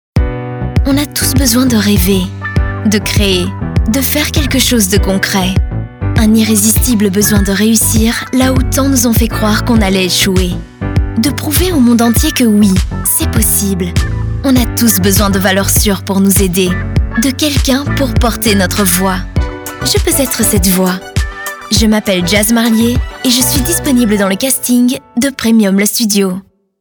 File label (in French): JAZZ; demo casting premium